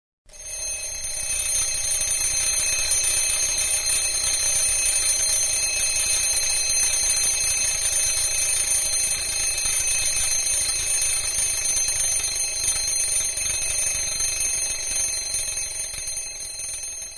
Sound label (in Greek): Ksypnhthri